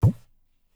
tabla; bahia; Dirt-Samples

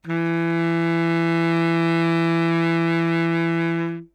notes e, sax; Dirt-Samples

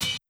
break ride

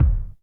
kick; Super-Dirt project

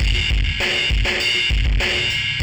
ind dist ride

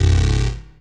stab; Dirt-Samples

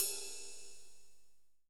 ride

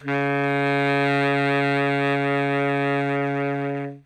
notes c sharp, sax; Dirt-Samples

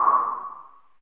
wind

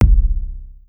kick

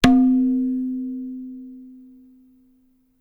tabla; Dirt-Samples

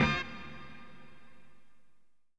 hit

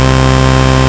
square

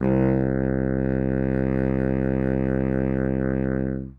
notes c, sax; Dirt-Samples

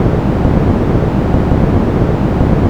chopper; armora

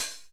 open hh

